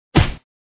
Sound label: Boss Collision